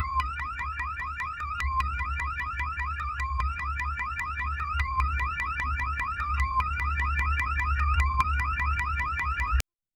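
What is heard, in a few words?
seamless loopable, rubber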